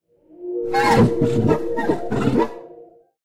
Nonverbal

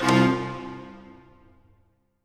music puzzle note up

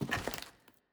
Footstep Glass

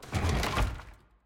Door Open; Interactibles

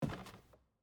Footstep Wood

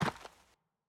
Footstep Dirt

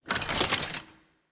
Lock Put Down

